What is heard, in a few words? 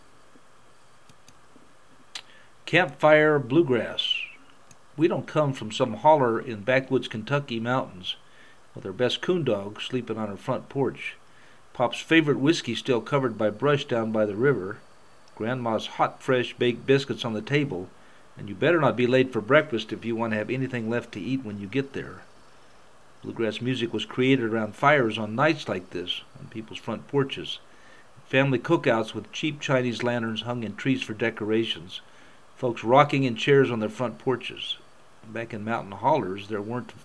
campfire bluegrass